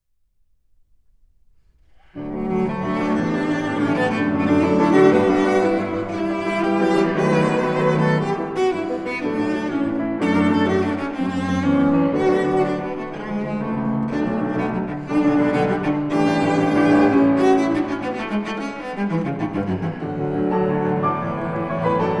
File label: Cello; Mendelssohn Satz1